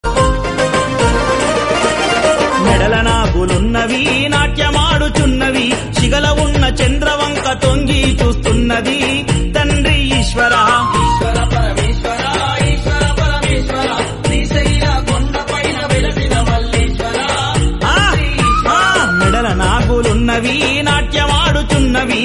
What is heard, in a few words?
Devotional Song